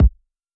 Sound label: Kicks